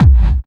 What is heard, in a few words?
hardcore 1, bassdrum; Ueberschall; Techno Trance Essentials; DRUMS